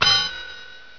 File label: Ding; cs hotel